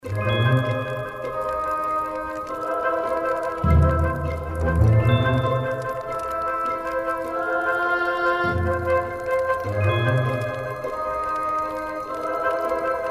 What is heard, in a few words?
free spin music; netent